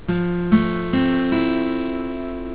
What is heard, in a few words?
chd fmj7, guitar